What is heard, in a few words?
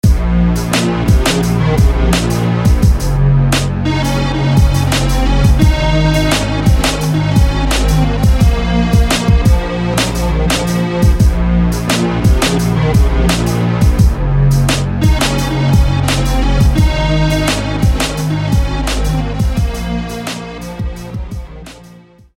Synth; Silverstone; SFB FX